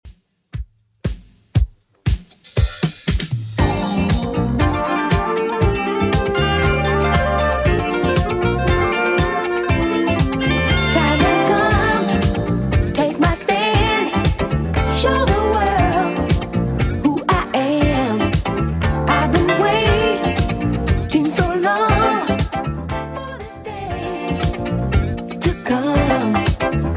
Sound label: 80s Redrum 118 bpm